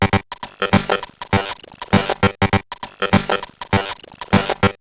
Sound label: Bent RX17 (100BPM), Circuit Bent; glitch